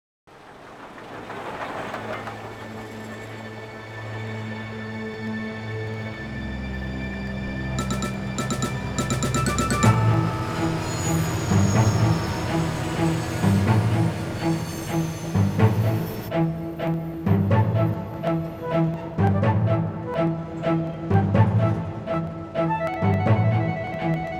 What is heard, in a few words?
Game Win; Railroad Tycoon II; PlayStation